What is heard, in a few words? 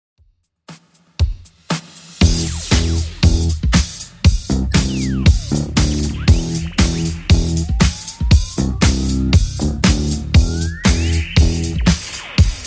70s Redrum 118bpm